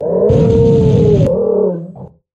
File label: jumpscare; torcher